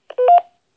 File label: original roger beep